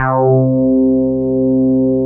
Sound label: BOW BS C4